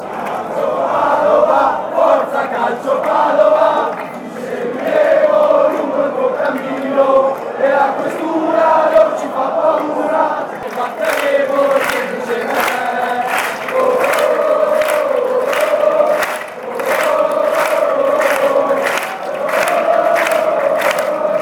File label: we will beat fanchants